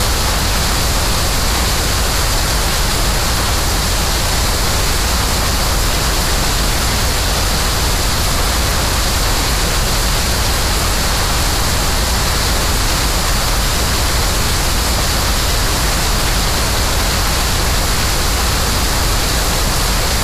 Rain; Life of a Naomi; bgs

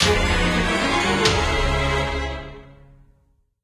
Curse; Life of a Naomi

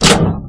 Switch; Life of a Naomi

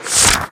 Book; Life of a Naomi

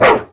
Dog; Life of a Naomi

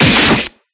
Bite; Life of a Naomi

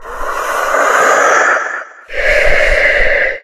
Devil; Life of a Naomi

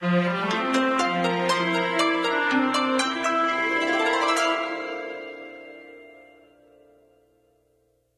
Musical; life of a naomi; Naomi Carrigan

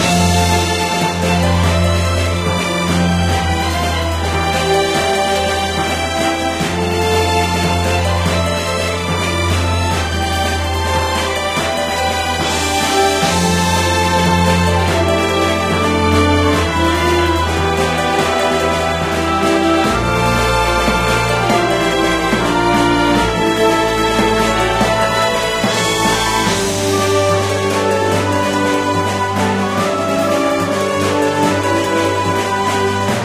Ship; ruu goblin quest

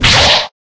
Attack; Ruu Goblin Quest

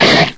Slash; life of a naomi; Naomi Carrigan

